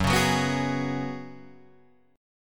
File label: F sharp Suspended 2nd Suspended 4th, Guitar Drop D